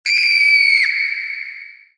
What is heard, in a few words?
scream; diretide; dota; soundboard